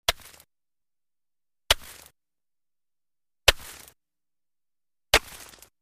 Chop Ice On Lake Shore, Axe Or Pick Into Solid Material, Some Ceramic Tone 4x